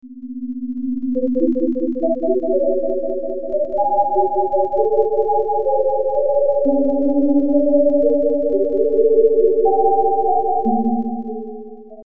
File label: melody reverb